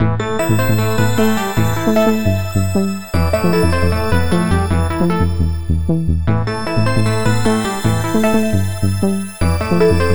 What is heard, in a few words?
freespin melody; Grand Fruits